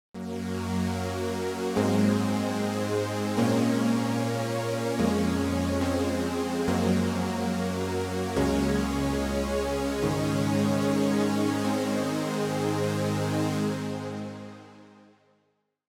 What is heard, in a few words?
OB Pad